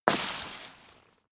metal impact wire; hits explosionen; metall

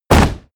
hit sweetener; impacts

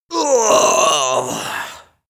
male death; schreie; menschen; soundpool

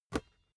basketball catch soft

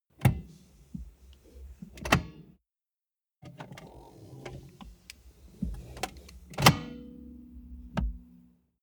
car bmw e46 interior seat pull forward

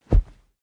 whoosh cloth low soft; swooshes-swishes

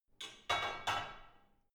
barbell only replace, fitnessstudio

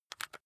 rock drill head remove heavy; maschinen; bohrer; soundpool

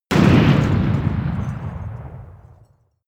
shot impact; hits explosionen; metall

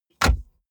car bmw e46 interior drink holder insert bottle

